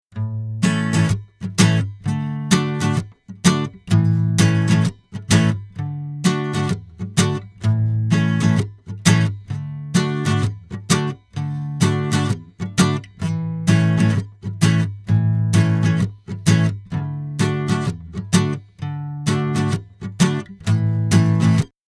acustic guitar unprocessed

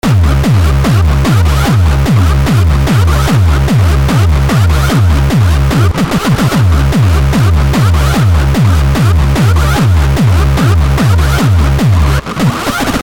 hardstyle

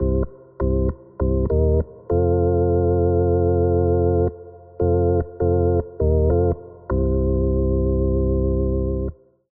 lush drive, organ dry

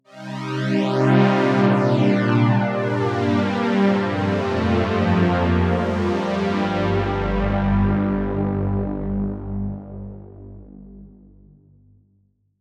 chorus pad; opxpro3

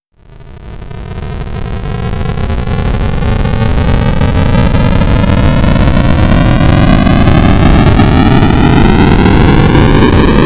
colorful noise, takeoff

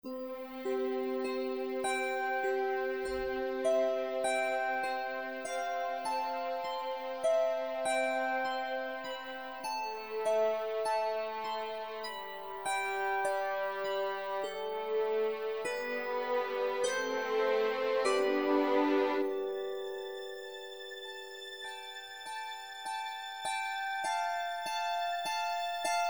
zither

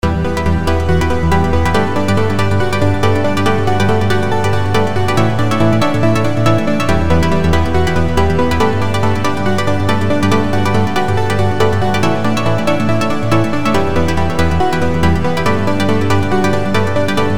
sawpluckz

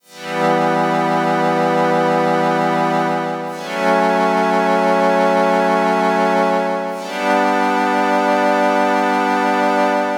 babylon pads

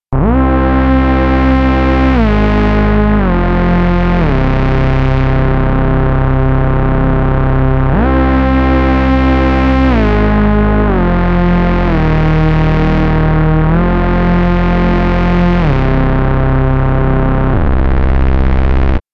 moog rising

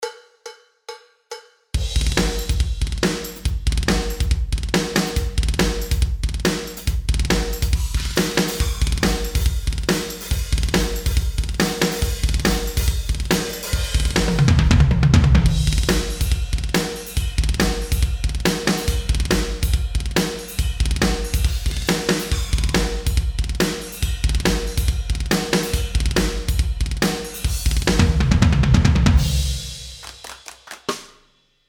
iceberg drums; fpc

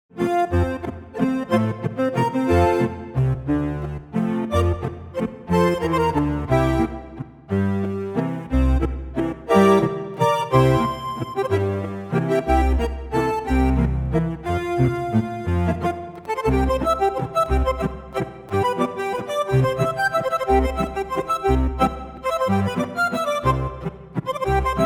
accordion; trium

